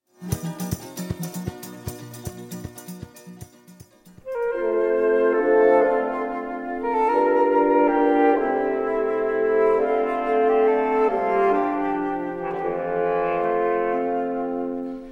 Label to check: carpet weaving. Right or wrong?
Wrong